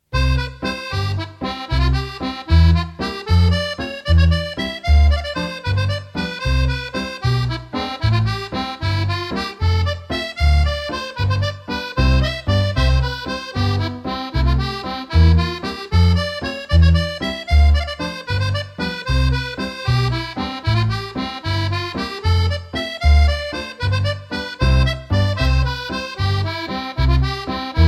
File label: Primary Heavy Jigs